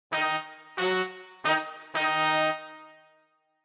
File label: Jazz Horns Loop End D#m 180 bpm; Jazz horns loop in D# minor at 180 bpm; Brass Loops